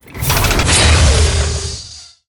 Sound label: Siege Engine Attack